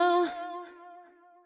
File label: Vocal OneShot